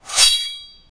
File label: sword slash with a strong; combat